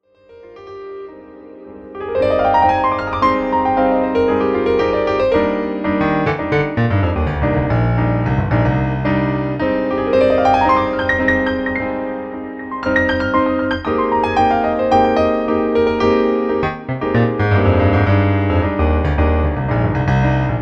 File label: Grand CFX